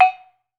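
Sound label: BONANG, Metalophones, Pitched Percussion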